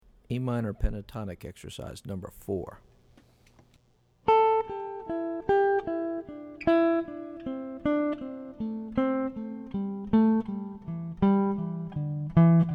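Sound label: Am Pentatonic Exercise, guitar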